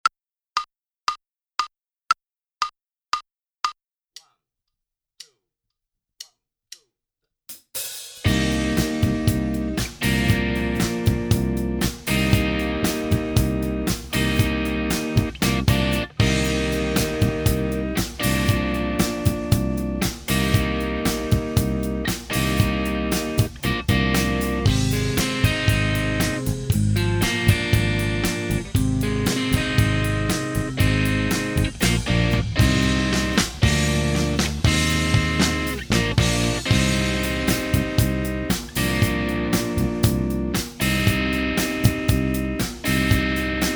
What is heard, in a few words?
Minus Keyboard; Inst Ensemble